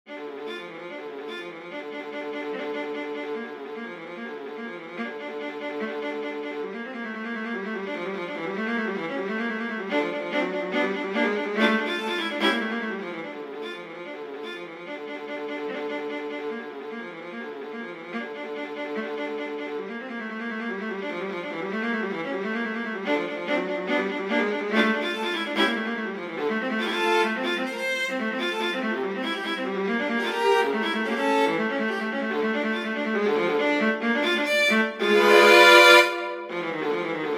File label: for solo viola; burgma ller johann friedrich franz; the storm from tudes